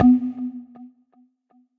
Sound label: Tube Ultra, Percussive; Build and Drop